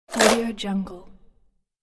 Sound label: plastic chain lever switch; fxprosound